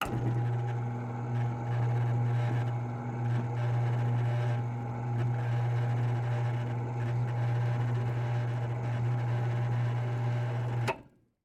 Projector screen, automated, motor, canvas, roll down, release; Eiravaein Works; Vaeyan IV